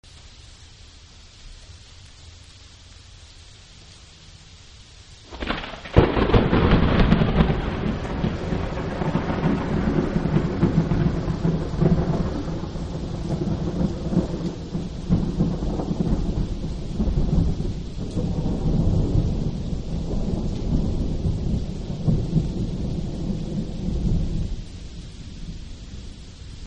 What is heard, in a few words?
binaural